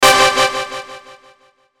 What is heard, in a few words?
Hit Brass Delay; Production Element Imaging Element Accent Transition; Sound Effects:Multimedia; Blastwave